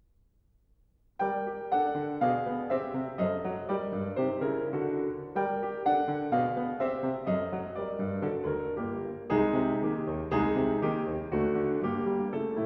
Improvisation for piano in G minor; Improvisation No. 11; Poulenc

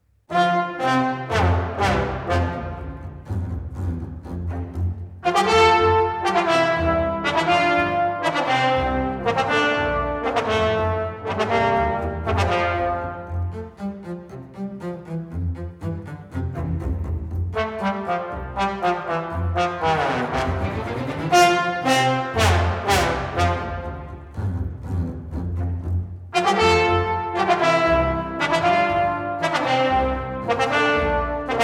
suite for orchestra, Vivo; Pulcinella; Duetto; Stravinsky

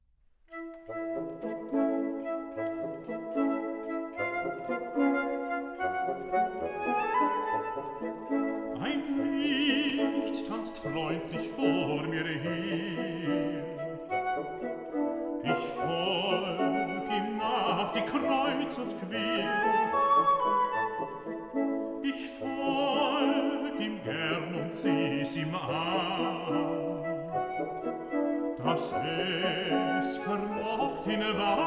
song cycle for voice & piano; Schubert; Winterreise (Chamber Version)

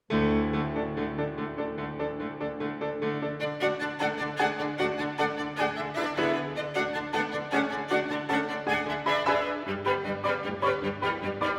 Romanian Folk Dances for violin & piano

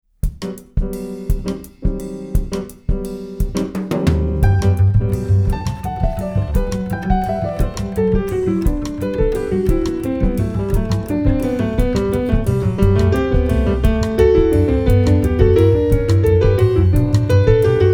Variations, for keyboard

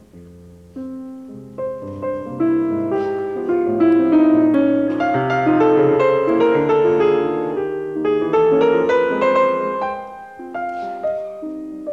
Piano Sonata in F minor, Variation; Schumann; ("Concerto Without Orchestra"), Op. 14

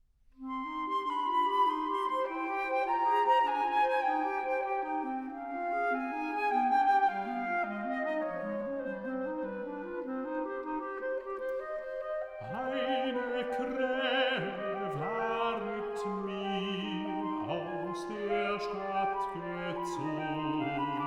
Winterreise, song cycle for voice & piano; Schubert; Winterreise (Chamber Version)

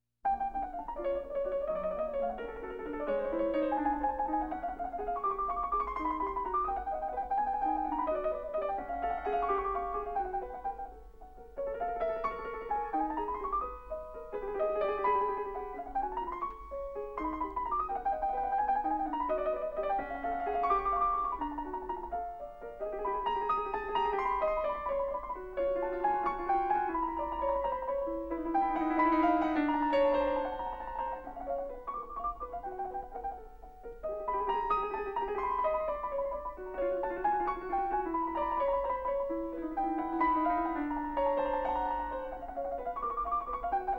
Etude for piano in F sharp major; Scriabin

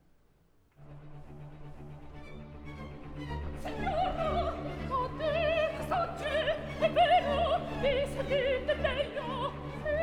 opera, Duetto; Act 3. Scena e Duetto: Signora / Che t'accadde?; Verdi; La Traviata; Violetta

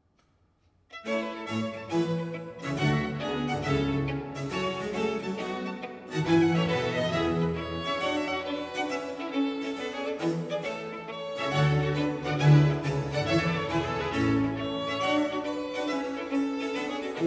Concerto Grosso in B flat major; Corelli

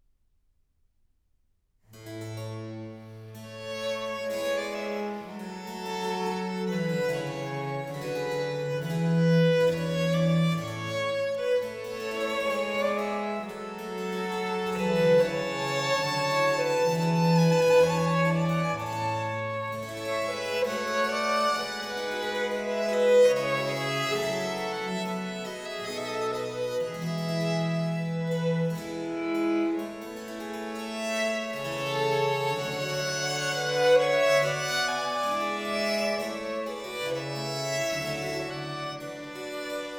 Sonata in G minor, Adagio, Trio Sonatas; Handel

